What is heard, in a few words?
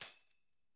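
Tamborim C Hit; Latin Percussion; Drums; Drum Hits; Tambourin; Ableton; Factory Packs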